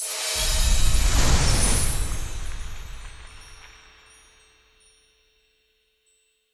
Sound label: free Spin Outro Hit; netent